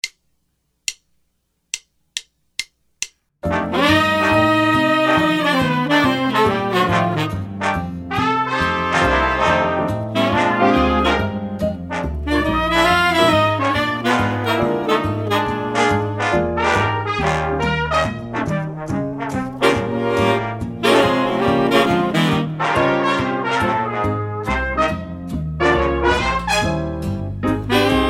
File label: Drum Set Audio